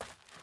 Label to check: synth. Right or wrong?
Wrong